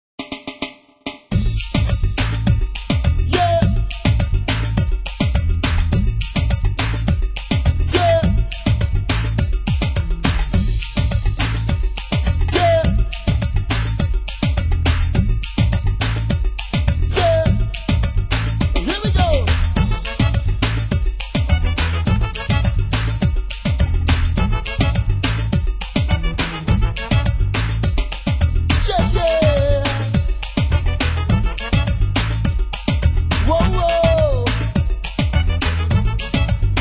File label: reggae